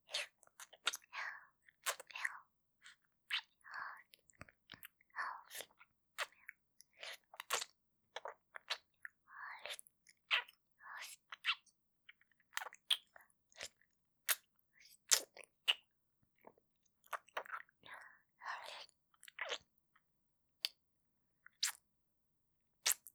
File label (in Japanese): チュパ音